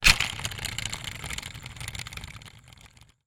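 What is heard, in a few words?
toy remote control car wheel spin air motor off; zapsplat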